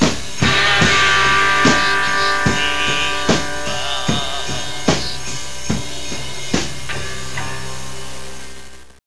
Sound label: sleigh bells; Michigan